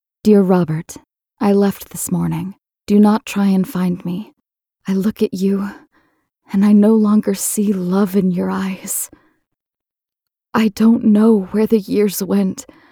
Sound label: Possessed; Mothership; UCR Audio Horrors